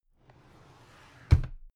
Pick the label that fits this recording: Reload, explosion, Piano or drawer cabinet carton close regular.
drawer cabinet carton close regular